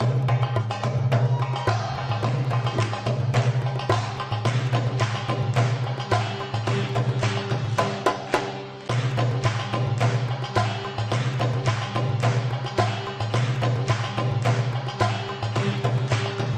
Danza de los bastones; VII FESTIVAL TEMPLARIO; EN JEREZ DE LOS CABALLEROS

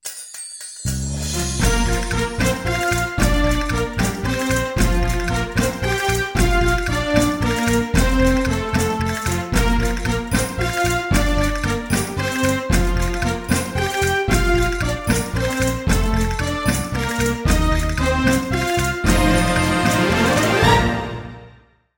SINTONIA; TV2

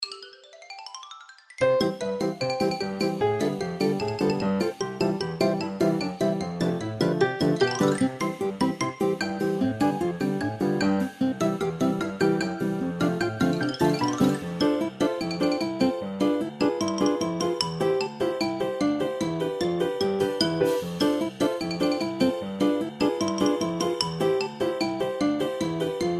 Circo

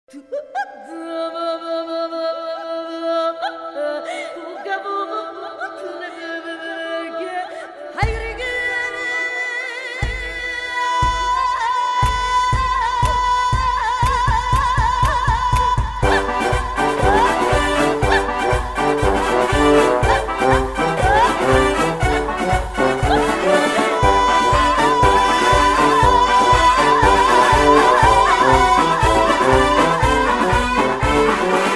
BAILE

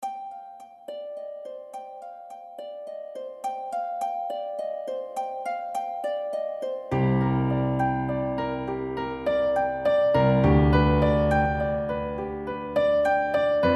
Pno Arpa; VESTIDOS FINAL; Vestido Manteles

